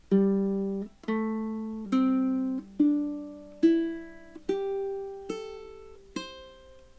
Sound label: C scale pentatonic, ukulele